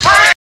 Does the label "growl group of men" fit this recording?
Yes